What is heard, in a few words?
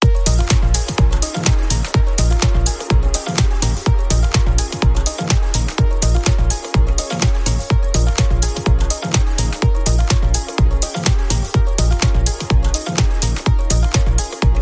Deep Ibiza House